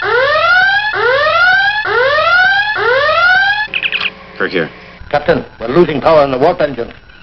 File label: siren; starTrek; captain We're Losing Power In The Warp Engines